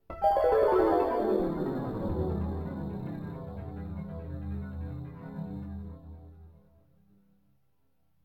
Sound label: Harp Descending